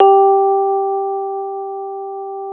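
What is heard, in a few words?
AMP FENDER G4, Keyboards Old School; USB Soundscan vol.09; AKAI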